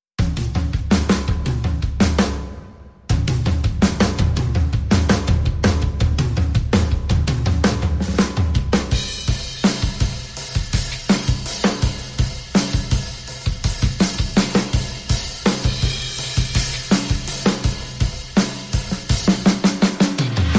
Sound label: Perc Only